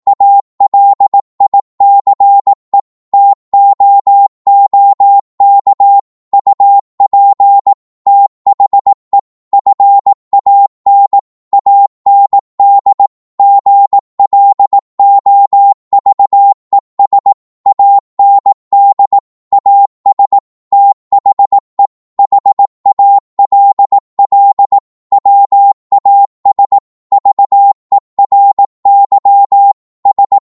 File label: 18wpm, Morse